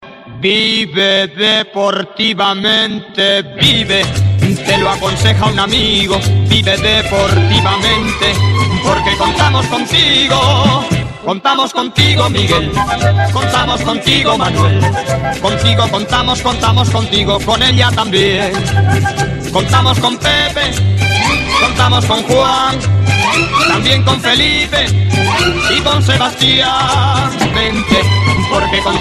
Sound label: song; iphone